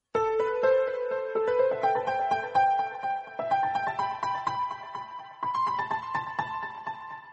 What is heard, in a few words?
lovely music best sms guitar tone ringtone; samsung sms ringtones